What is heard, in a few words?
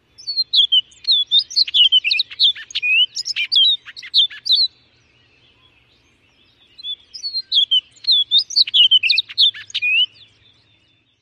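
Orchard Oriole, song number 2; IA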